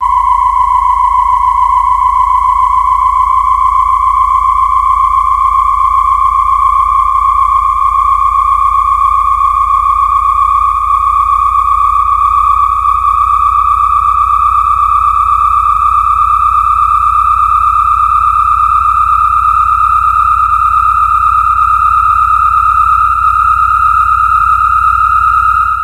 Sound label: Mysterious Sequence